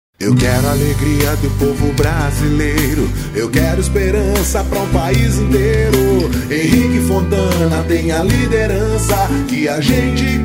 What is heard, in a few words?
Jingle; Henrique Fontana